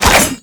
Spin Whoosh, Weapons; Infested; Coda Caustacyst

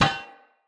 Incense Burner Contact Impact

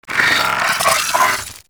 Vocal Idle; Warframe; Moa Satyr

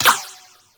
ricochet; Warframe; Cowgirl

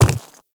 Quadruped Medium Walk Default, Footsteps

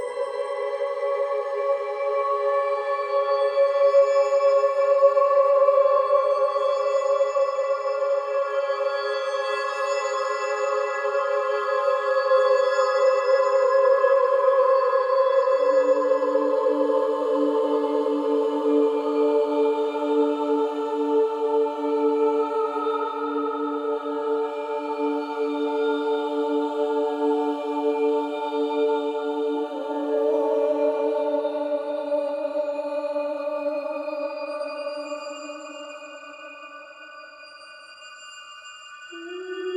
Caves Amb Pads, Music; Duviri

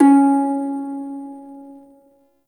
Prime Shawzin Oct Two C Sharp; Warframe

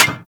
Melee Staff Impact Generic; Warframe; Staffs

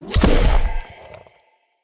Corruption Wave Spike Burst; Enemy; Lady Deora; Abilities

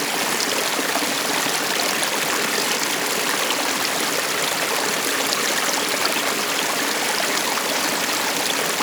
Forest Water Brook Flow Medium Loop; Props; Warframe; Grineer Forest

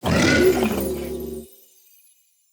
Cannonball Cast; Bromius

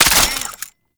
Belt Fed Rifle Pre Fire; Warframe; Tenno; Frumentarius

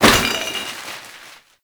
Garbage Bag Destroy; Warframe; Ambience; NineteenNinetyNine

